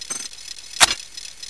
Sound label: scythe reload, weapons; thanatos7